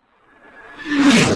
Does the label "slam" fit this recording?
Yes